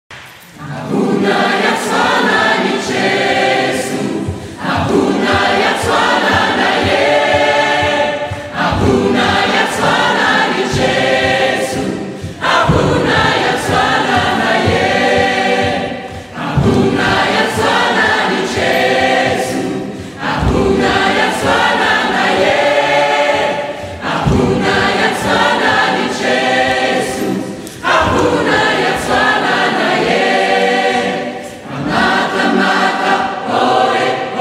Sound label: a capella